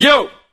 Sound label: Vocal Oneshots; Vengeance Samples; Vocals